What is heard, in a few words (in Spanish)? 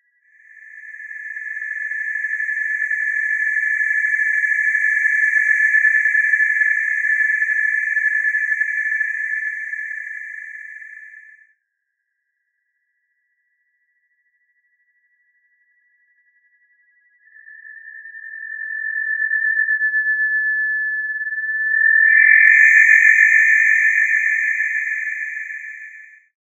Loops mutantes